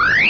Electronic ascending series (short "on" notification); RadioButton on